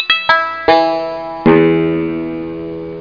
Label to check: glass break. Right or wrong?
Wrong